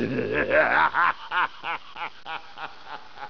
HAHA

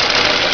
burn

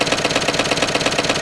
PUNCHER, MACHINES

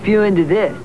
SPEW

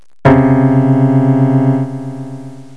PIANO

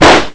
Explosion; MarkoSuominen; GraveYard

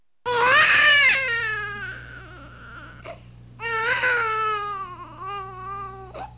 BABY CRY

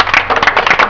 handclap

